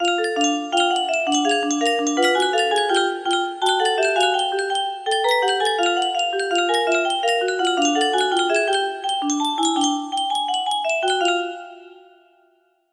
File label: music box melody